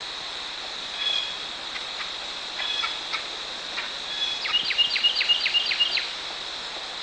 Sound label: bird